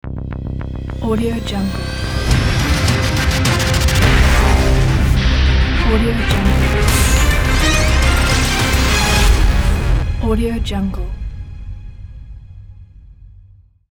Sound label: cinematic action logo; audiotv